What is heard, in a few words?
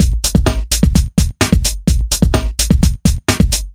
House Beat, 128 BPM